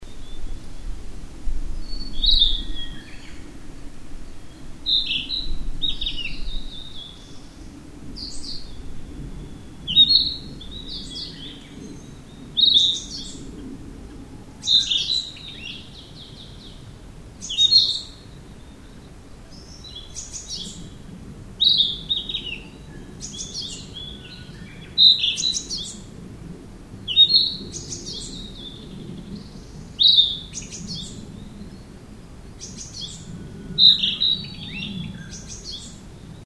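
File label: kibitaki, narcissus flycatcher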